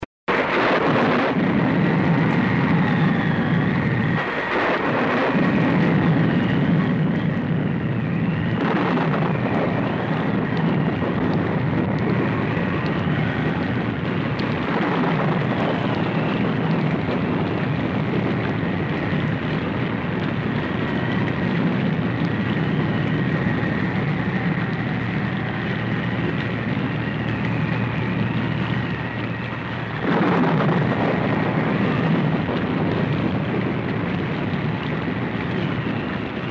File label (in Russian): silnyj veter dozhd i gorm; Звук ветра и дождя